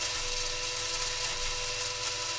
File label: Motor; baldis basics